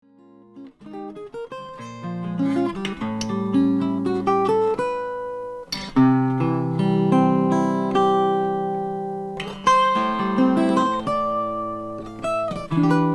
archtop flame maple; bozo

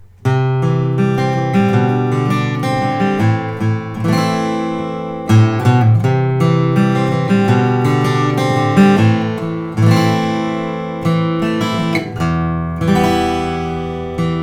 froggy bottom c limited rosewood; natural

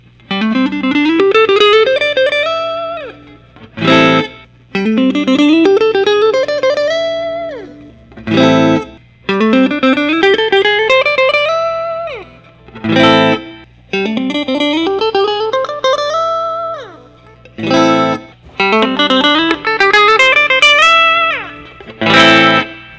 Fender Custom Shop

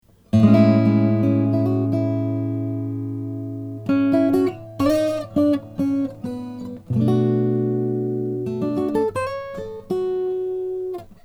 lowden f50c bastogne walnut

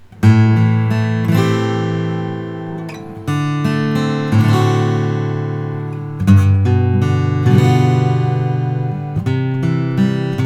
collings om2hg natural

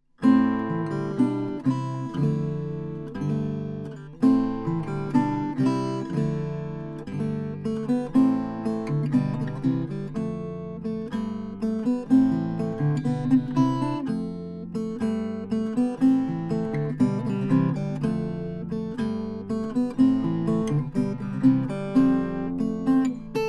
Bourgeois piccolo parlor all mahogany

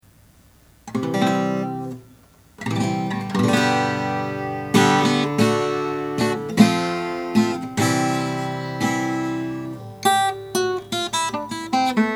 harmony h165 mahogany; 1960

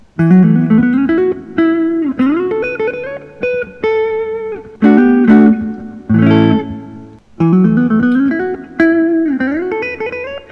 little sister tobacco burst

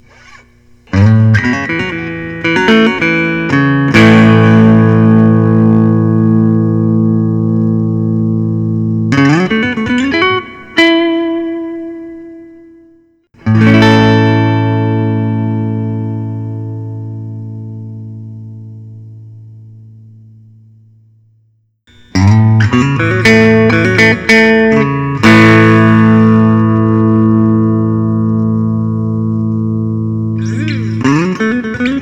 elite honey; cp thornton elite honey

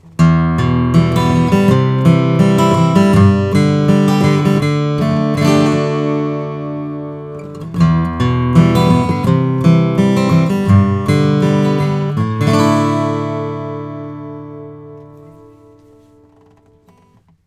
Froggy Bottom H12 Deluxe rosewood

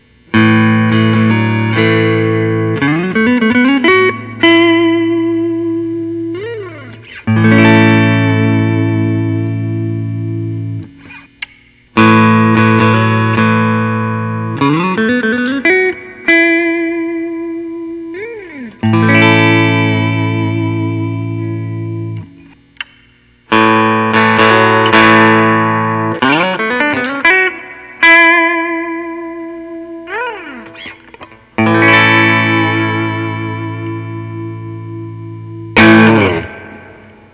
gretsch custom shop; stephen stern master

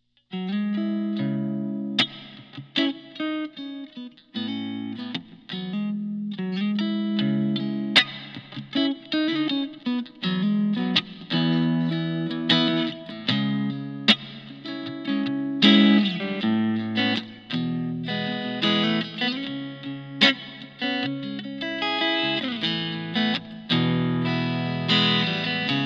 abasi concepts space t autumn burst

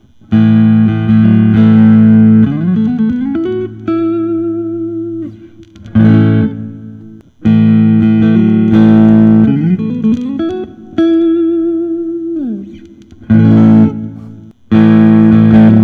vinetto vince cunet dc 60 sb brazilian

